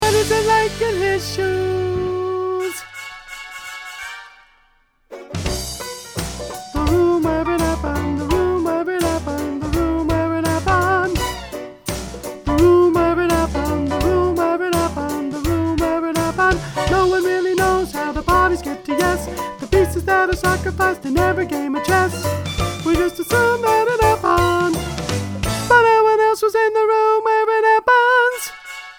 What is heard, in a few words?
alto